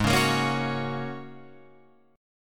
Ab 7th Suspended 2nd Suspended 4th down Guitar Open F; Ab7sus2sus4; strummed; chordshape; JGuitar